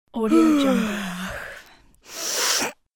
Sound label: female yawn and snort